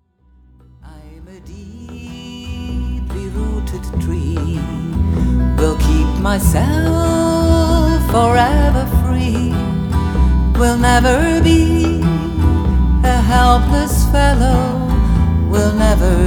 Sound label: Woman Song; Ubuntu